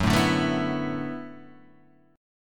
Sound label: D 6th Add 9th F sharp